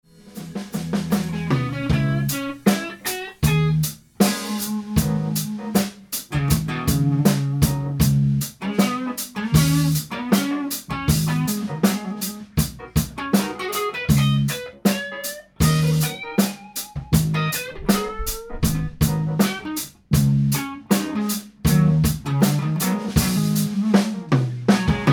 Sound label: rehearsal solo